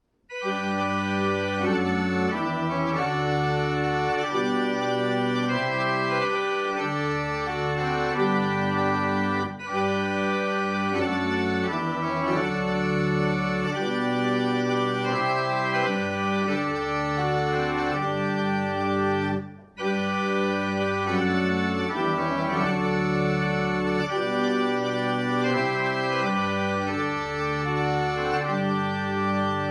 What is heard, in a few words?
Single Chant, barrel organs; Quantoxhead